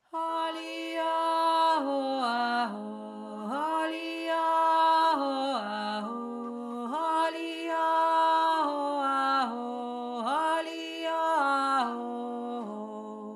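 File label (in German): Jodler, tiefe Stimme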